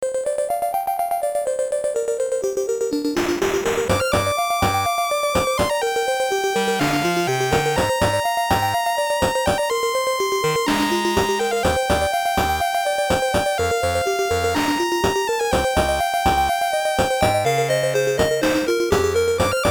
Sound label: BGM, WonderSwan Color; Inuyasha Fuuun Emaki